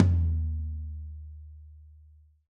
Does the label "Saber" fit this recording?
No